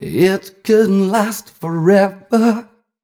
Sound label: m 128 mel b-flat, Vocals